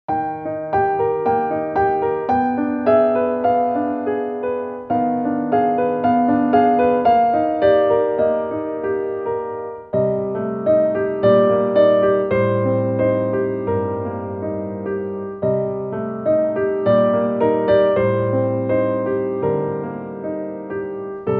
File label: arietta piano; grieg; アリエッタop.12-1(叙情小曲集より); グリーグ